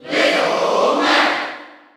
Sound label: Cheer Dutch; Little Mac; SSBU